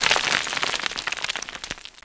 small, rocks; ambient; valve

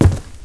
pl wood, player; valve

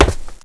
concrete; valve

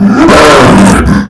zombie alert; garrysmod; prop hunt

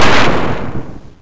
hit metal; valve; hardhits

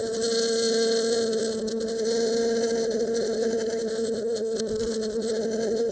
buzz, hornet; valve

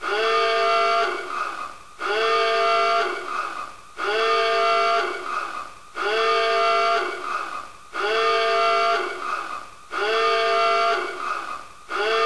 klaxon, dutch, space; valve

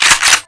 spas cock, weapons; valve

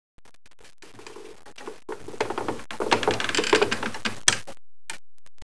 idle boat; ambient; valve

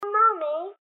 child mum; garrysmod; ob nightmare compress

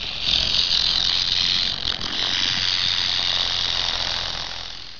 rattlesnake; valve; vamp

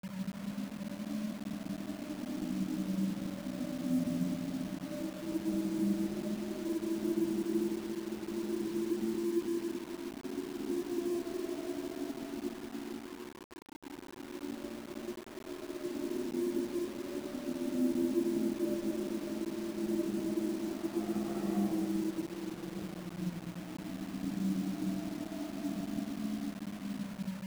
creatures; valve; kk halloween

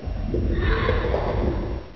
bloater idle; valve

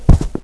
carpet; valve

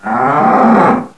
die; valve; ambient; animals

